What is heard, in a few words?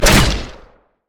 bola strike; rengar